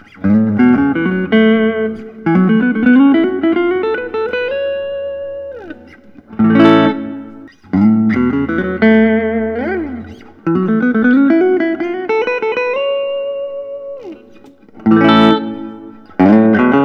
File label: bluesmaster s brazil vint; johan gustavsson